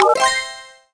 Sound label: Correct